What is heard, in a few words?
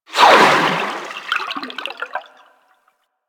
Sharkwing Movement Transition, Vehicles; Warframe